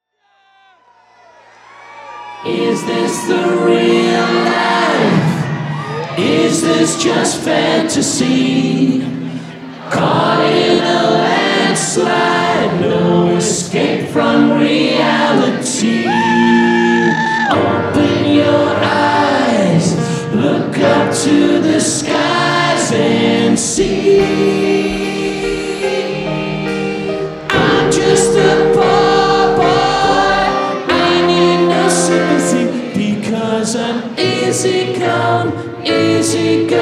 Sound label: Intro, Live